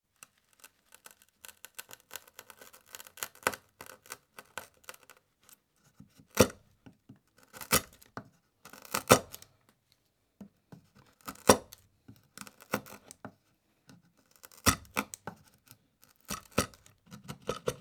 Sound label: Chiselling Wood (Splintering Nois; Construction; BBC Sound Effects Library